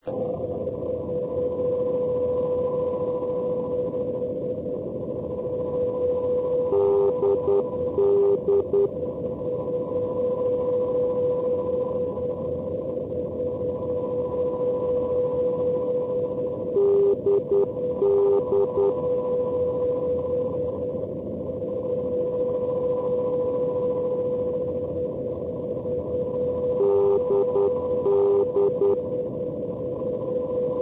ndb oostende; europe

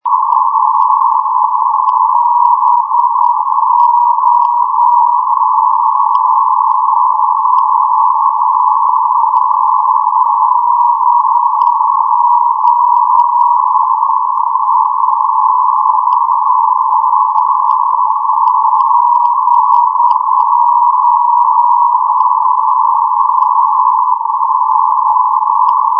ndb zadar

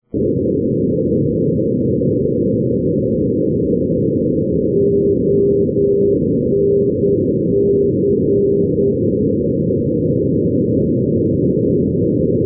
ndb charleroi; onc-323; europe

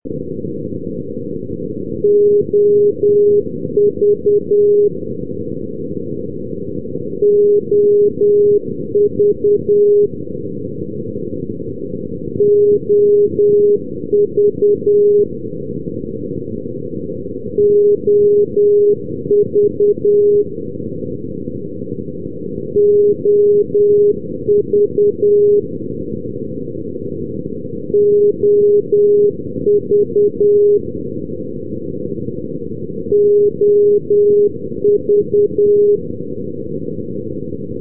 ndb salthamn visby; europe

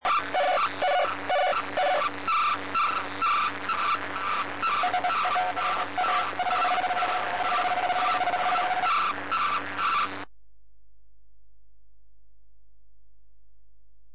sitor id

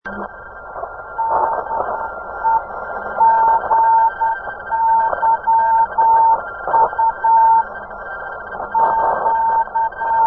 cw; europe

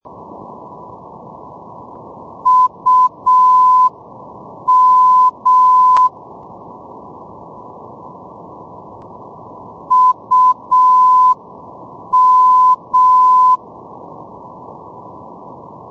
ndb ivanovskoye; moskva

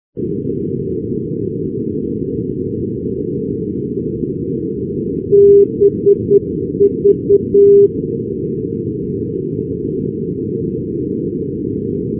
ndb beauvais tille bv 391; paris; europe

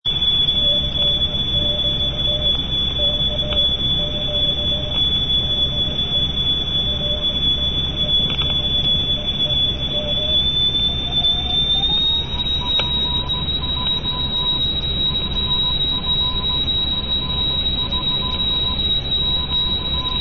ptt fort de france radio cw

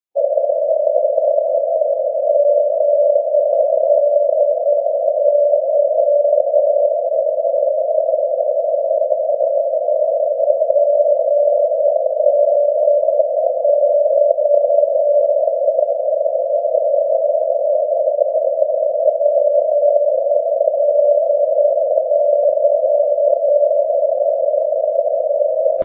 ndb mariehamn godby; europe

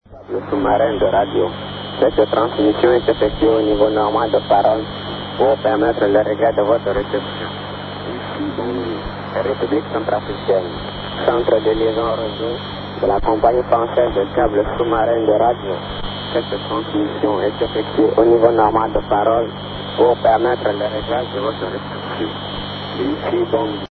usb, utility radio stations

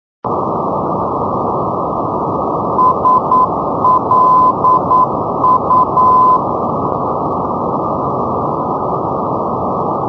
ndb saint lucia; slu-415

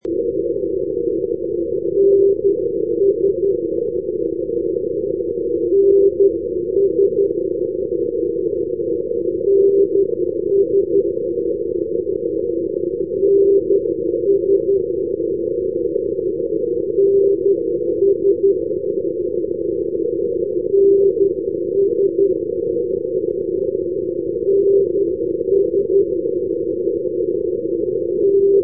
ndb skovde; europe

